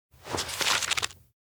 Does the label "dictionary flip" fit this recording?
Yes